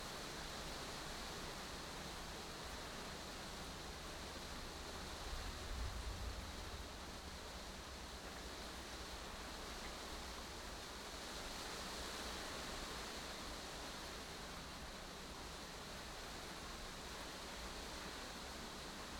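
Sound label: Rain Interior Glass greenhouse 10m2; Indoor Ambiences; Borg Sound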